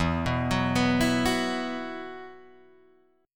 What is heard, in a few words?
E Suspended 2nd E, Guitar, Drop D; 2,2,4,4,5,2; down